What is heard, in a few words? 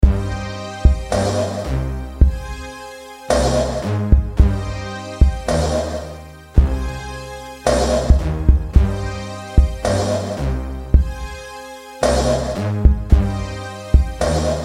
Sinewave